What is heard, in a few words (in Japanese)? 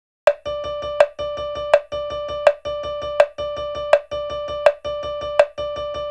クリック